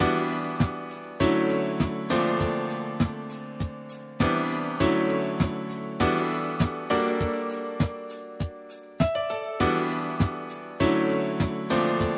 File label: smooth jazz; smooth jazz cafe